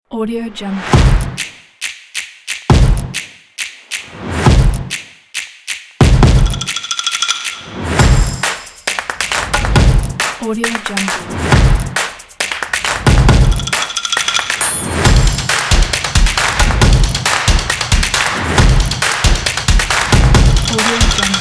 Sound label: percussion pack